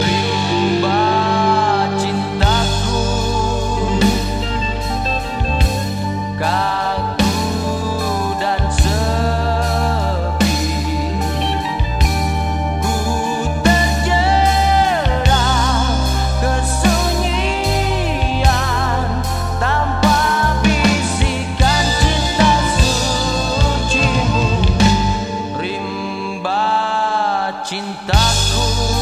RIMBA

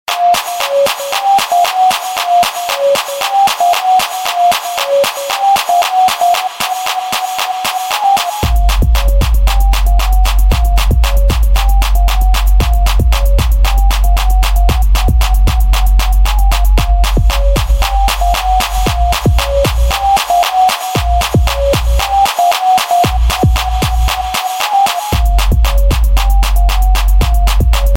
Twerk Beat

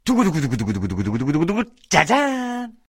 Drumroll

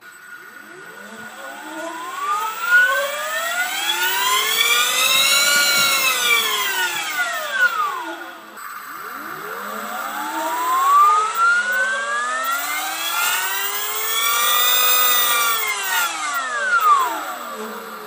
Zero Motorcycle

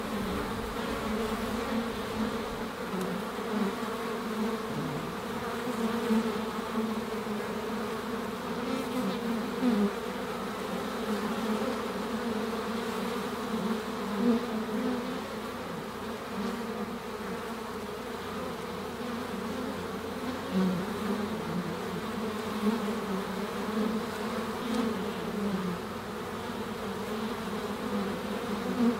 Honey Bee Swarm

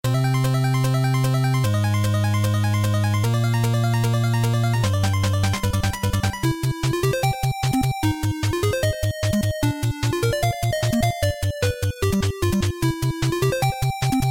volcano; life force

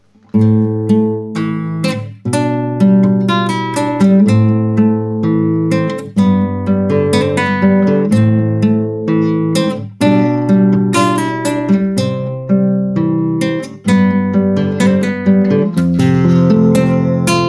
Acoustic

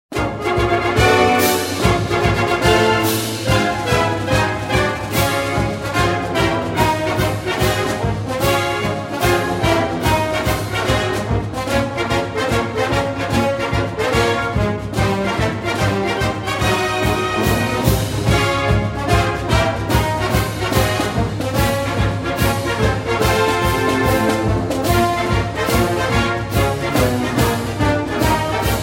Fight Song; Iowa

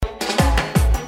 Drum SMS Tone

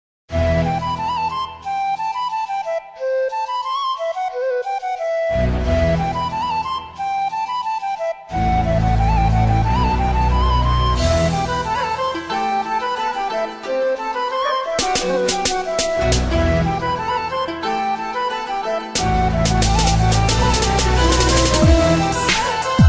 Oriental Arabic

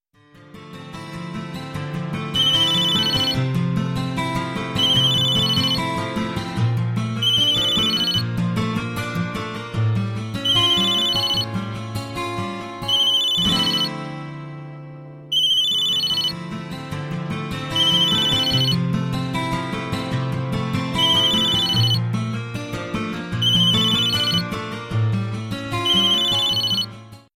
Guitar Business Tone

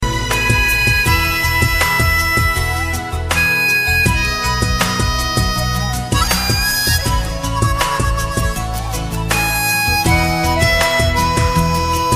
mouthorgan romantic; classical

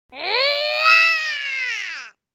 Raaawwwrrr

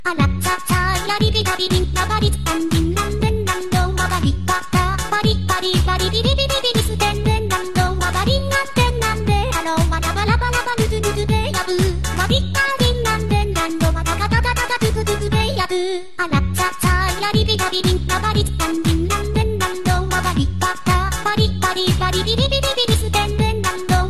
polka mix, dance

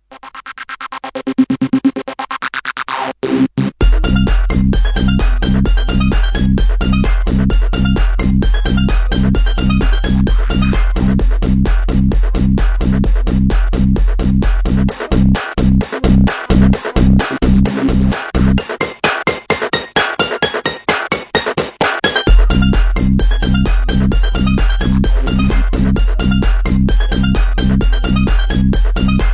trance; holiday

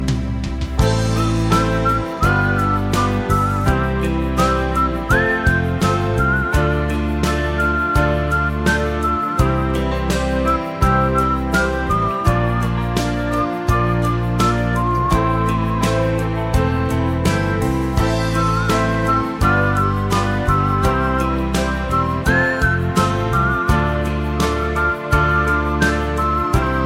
The Whistling

